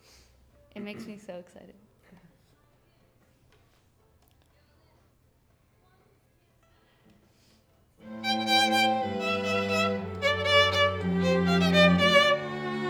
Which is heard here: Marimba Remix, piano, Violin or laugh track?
Violin